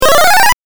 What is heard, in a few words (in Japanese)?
8bit 上昇